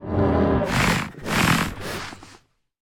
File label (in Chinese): 监守者嗅闻声